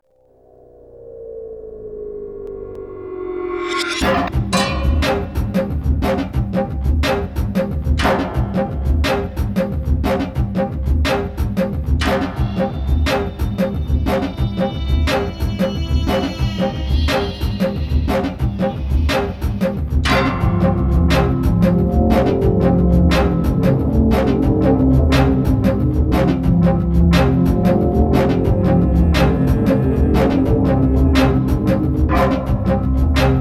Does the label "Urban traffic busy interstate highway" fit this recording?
No